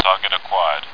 radio

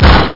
BOOM 3D; guess

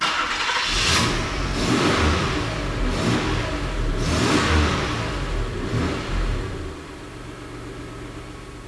start, cars; transpo

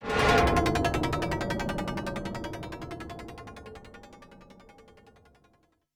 AMBNT 160; eJay; Ambient

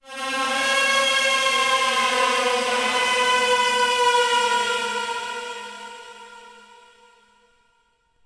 ice; DAoC

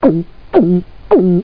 GULP; How Many Bugs in a Box; juicebar

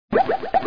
new fax; MRSHot2003

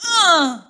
shar female hit; DAoC; Toa; Gamestar

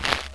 step; Notrium

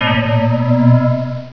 Bell Bend

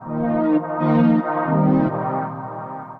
synth pad ambient 160 C; Sphere

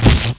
Hit; Macworld (1998-05); Games World; Quake; wizard

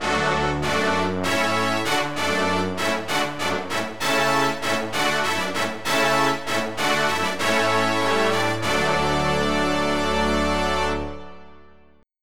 brassec

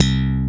Slap Bass; cubic

